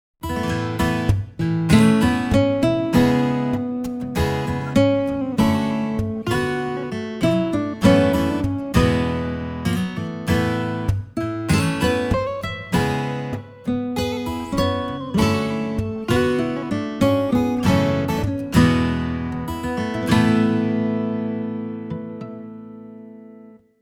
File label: Steel Nylon